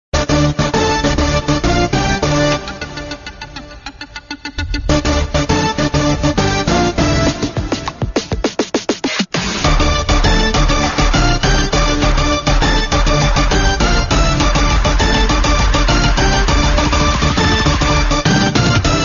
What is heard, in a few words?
agnathavasi interval bgm